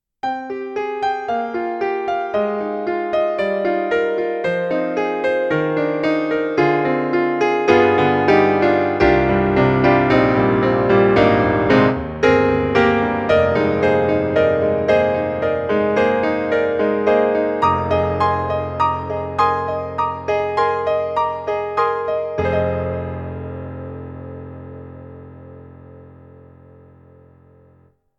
Kurzweil MicroPiano MP-1 Classical Piano, ensemble tuning (440-tuned)